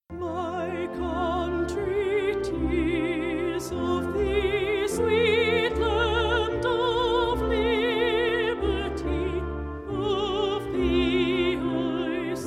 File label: Concert Band; My Country 'Tis of Thee (abridged); United States Air Force Heritage of America Band